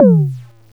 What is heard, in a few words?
TOM; KillerTweaksII; DirectWave